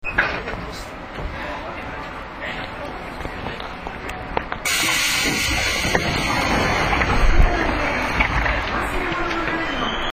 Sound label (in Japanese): ドア開け, 103系; JR化後車両; JR西日本; ドア開閉音; JR西日本に所属する車両のドア開閉音になります。